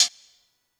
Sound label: Hihat; Sonic Foundry (Sony Creative Software); Stylus Pressure Urban Grooves on Digital Wax; Summer Jam